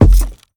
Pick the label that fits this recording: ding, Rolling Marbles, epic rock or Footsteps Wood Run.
Footsteps Wood Run